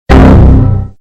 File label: bass boosted windows xp error